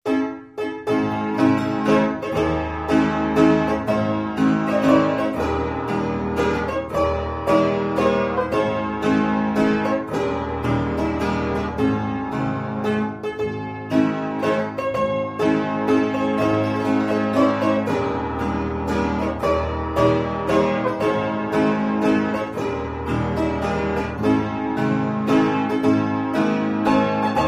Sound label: Piano Plays